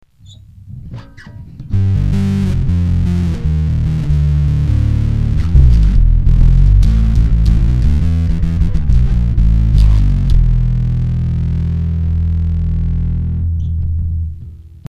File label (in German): mittel ohne octa; ak-workshops